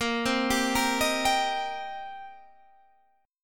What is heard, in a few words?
C Minor 7th Bb